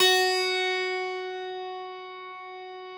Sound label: F2, Harpsichord; Harpsichord +ped; Total Piano